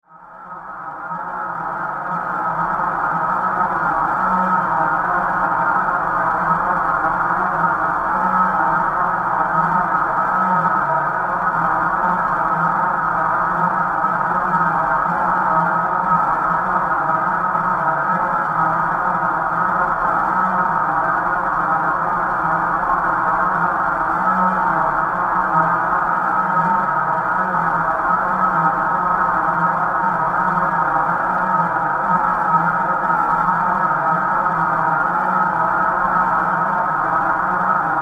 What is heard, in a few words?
Drone Asteroid Belt, drone sound effects; science fiction sound effect; star wars / trek style